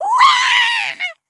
death flying, grunt involuntary; covy involuntary

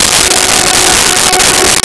Strings Gb4; Nintendo; July 2020 Gigaleak

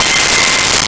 Elec Piano1 C6; Nintendo; July 2020 Gigaleak

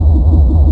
spaceship, Enemy; Enemy SE; Nintendo; July 2020 Gigaleak

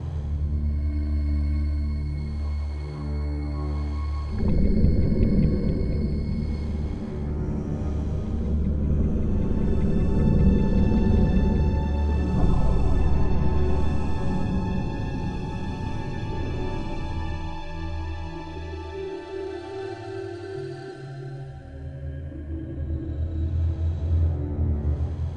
spooky, loop

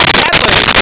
gatling; Nintendo; July 2020 Gigaleak

